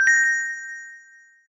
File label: hit; smite